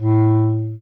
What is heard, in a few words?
bass clarinet; Notes; Winds